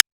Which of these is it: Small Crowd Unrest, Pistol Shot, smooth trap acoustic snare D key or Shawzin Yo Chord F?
smooth trap acoustic snare D key